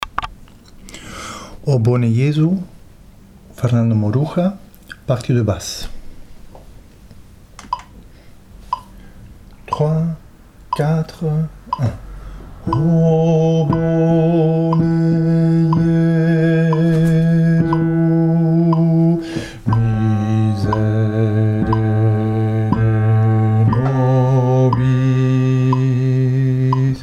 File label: basse